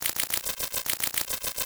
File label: Science Fiction Sci-Fi Electronic Computer Scanner